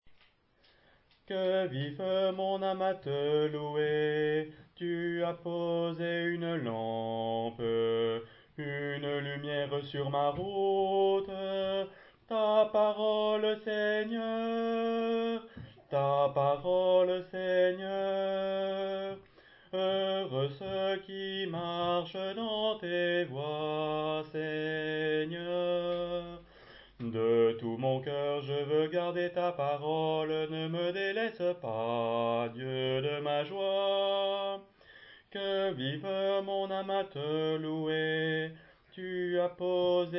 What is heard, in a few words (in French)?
Voix de basse